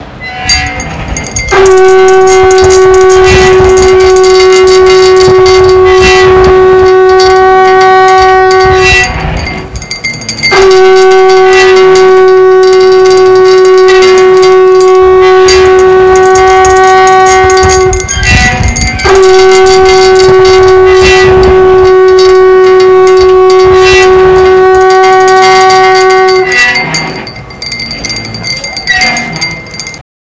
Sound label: Ensemble